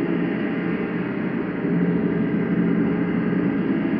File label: sparse soundscape samples; ReLooped